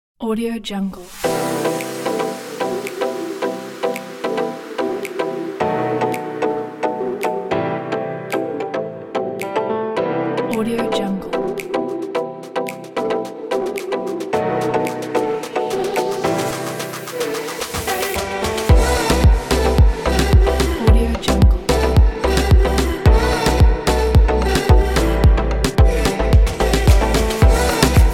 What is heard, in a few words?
sexy